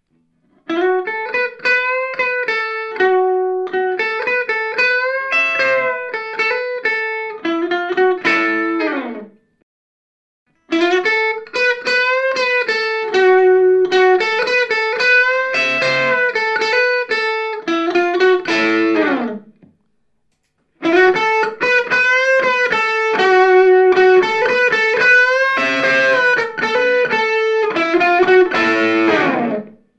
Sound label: solo bridge single coil